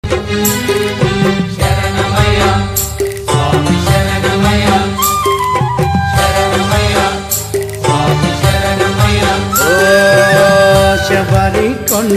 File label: telugu bhakti ringtone; ayyappaswamy